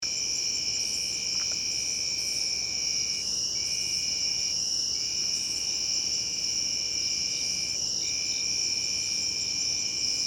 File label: Cricket; HerringRun; CricketCrawl